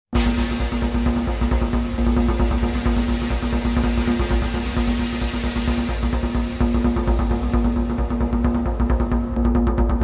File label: Disturbing Fusion, War Music; Civ5